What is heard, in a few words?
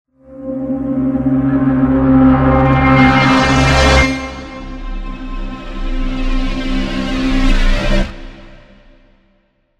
Transitions Reverse Tonal; Gravity 2 Menu XL